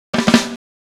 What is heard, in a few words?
Snare Fill